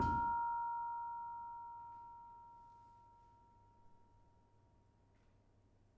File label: Kempyang soft, gamelan